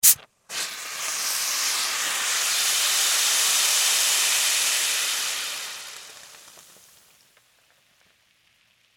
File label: Soda Bottle Open, Spray, Fizz; Foley; Fizzes